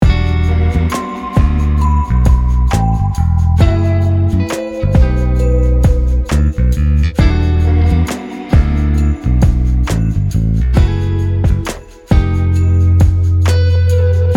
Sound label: Soulful Pop